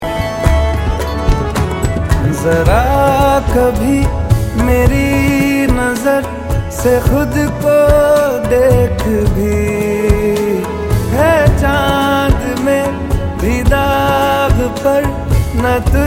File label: song